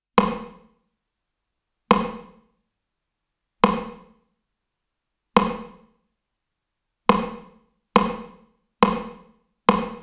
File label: Sony DRE 2000 TR909 Sony DPS R7 Studio Ambience for Drums